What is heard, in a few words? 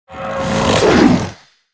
Caiman Giant buff Skill